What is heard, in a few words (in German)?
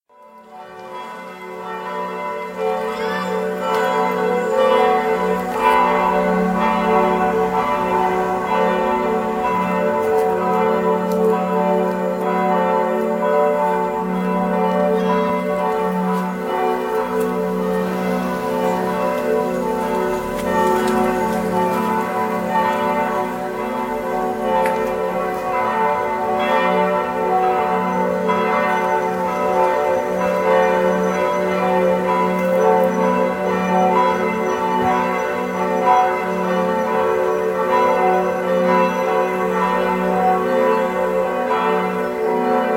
Glocken Bauernkirche